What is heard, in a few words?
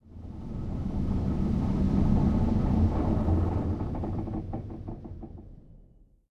leo2a5 engine int stop, tanks; War Thunder